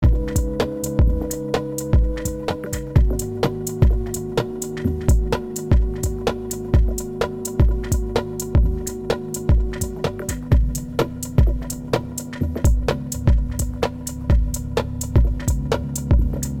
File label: deep groove frequency shifter